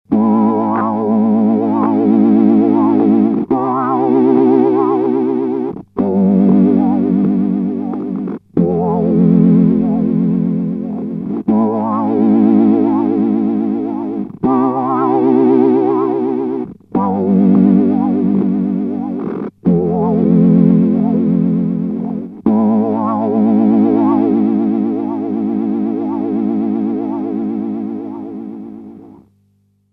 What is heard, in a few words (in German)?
Subdecay Pixelwave Gitarre mit anderem Gitarrenpreset